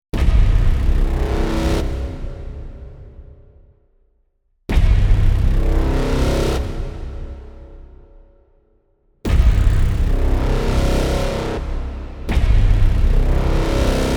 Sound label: Bone Grinder; McGregor SFX